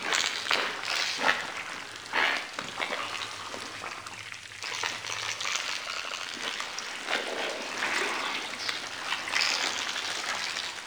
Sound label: Lair Chatter Loop Interior; Warframe; Ambience; Sentient